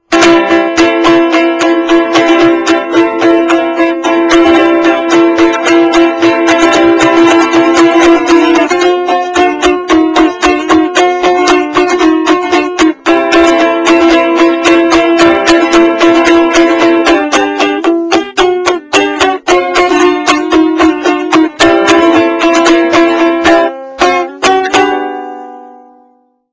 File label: Boogie Bari